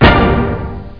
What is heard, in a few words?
Orchestra Attack g; Troubled Souls